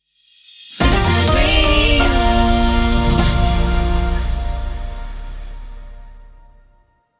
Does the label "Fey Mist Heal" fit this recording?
No